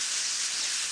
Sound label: overheat